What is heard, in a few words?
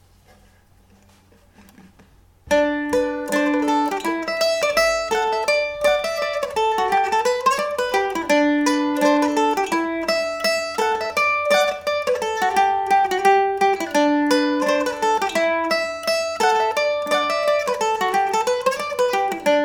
mcquillans hill, mandolin